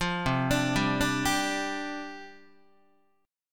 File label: C Suspended 2nd Suspended 4th, down, Guitar